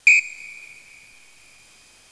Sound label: Whistle